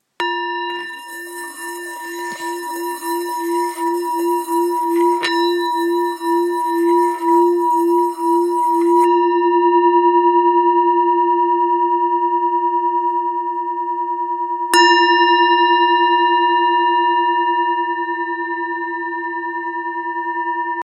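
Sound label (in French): Bol ancien fa, bols chantants tibétains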